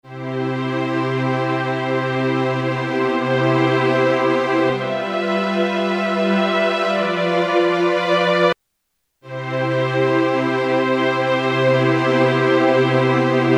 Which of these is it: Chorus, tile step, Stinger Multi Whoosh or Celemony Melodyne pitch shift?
Chorus